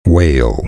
wail

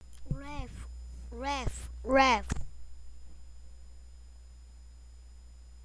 laugh

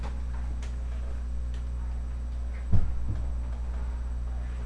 coin

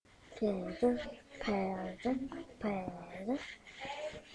pad

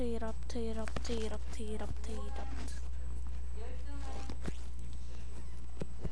erupt

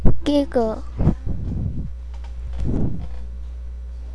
giggle

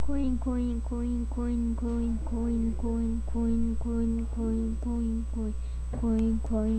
coin

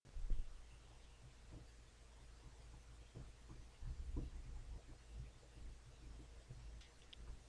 cello

